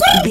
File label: pigeon stress